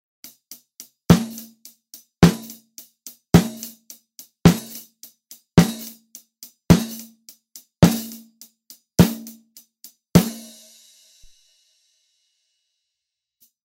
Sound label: Snare Sennheiser MD441 flat; Test mikrofon